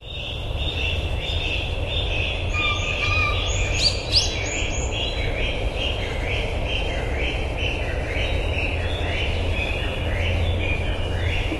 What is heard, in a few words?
bamboo partridge